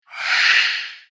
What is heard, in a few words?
Gryphon Shriek, fantasy sound effect; Monster Sound Effects